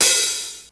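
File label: hat open; xr10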